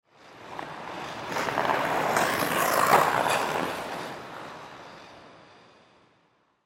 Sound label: Ice Skate Pass By Slow Scrape Rink; Ice Skating; Sports